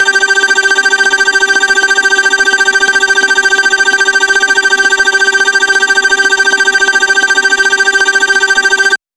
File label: cartoon style siren, alert